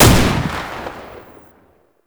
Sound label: Fire, Weapons; Warframe; Scaldra AK